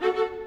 Violas, Orchestral; Sonic Foundry (Sony Creative Software) - Orchestral VOL-2

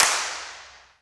VERB CLAPS; Big Fish Audio; Synth City

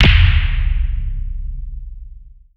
BIG BOOM, percussive

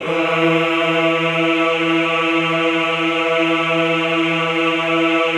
EHH E2, Classical Choir; Best Service; Extended Classical Choir; EHH MIX

